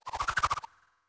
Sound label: Boomerang Return